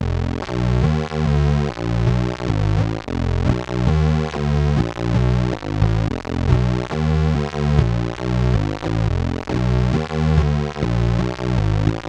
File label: Synthline Original